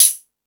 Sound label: Space Drums, Drum Machines